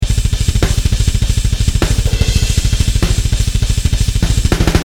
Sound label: 32nd DB Groove